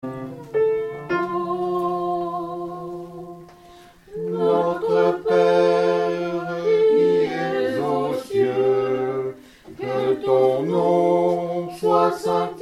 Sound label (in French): Notre Pere ensemble